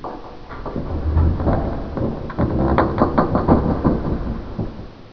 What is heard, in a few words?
ship groan; ambient materials; Half Life Model Pack; Half Life 2